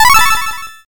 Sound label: level up